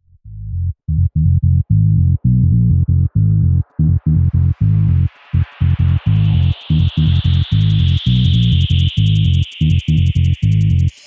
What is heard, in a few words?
space drums